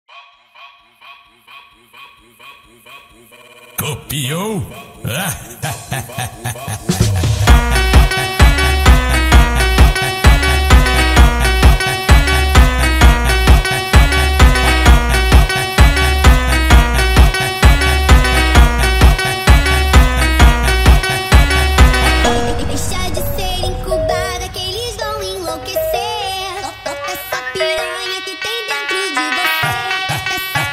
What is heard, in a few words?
Brazilian Funk Phonk